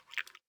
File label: slosh